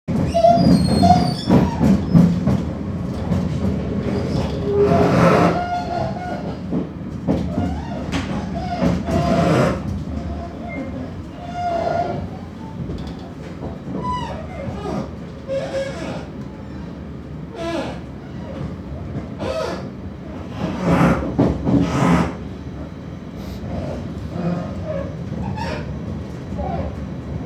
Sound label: fieldrecording train moaning; weeklybeats-2020